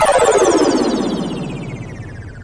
Electro FX; Ueb HE Electro FX

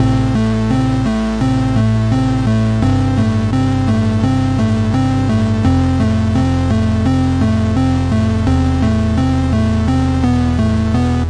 sad c64 8bit music, synth and electronic loops

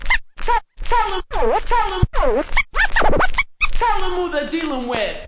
turntable tell em, Scratching and Turntablism

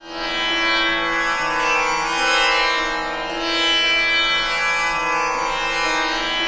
Lalit, Far East Drones and Plucks

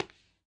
Taka, Tabla; ETHNIC and WORLD PERCUSSION; Tabla Kit Large

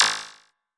machine, electro hits; Hip Hop; style kits

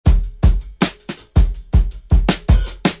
160 understated funk, drum loop; 141 to 160 bpm

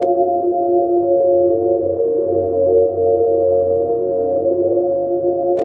harmonics only, pads

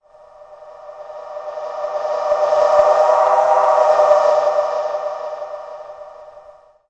breathy intro, pads

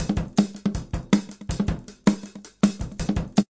160 round skins, drum loops and breaks; 141 to 160 bpm

